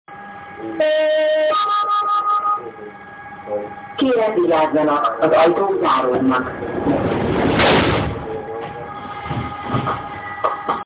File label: budapest, bus